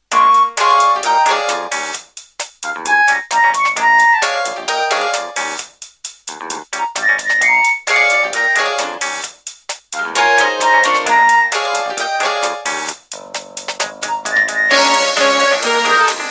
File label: nokia 1200 blue ice ringtone